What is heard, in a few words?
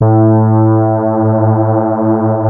FAT LEAD, Powered DX; Wizoo